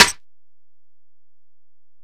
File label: Snare